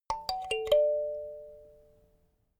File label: notification kalimba sequence major